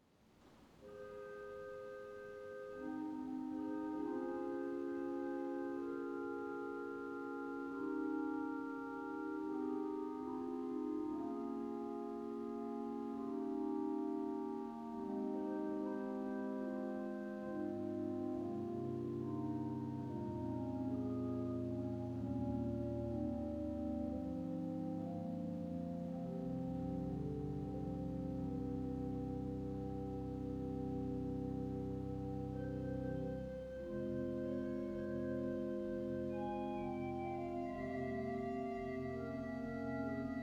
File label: quintadena & pommer, organ; Quintadenas Two here - the Solo one is full blooded, no compromise; the Swell Gedektpommer; phoenix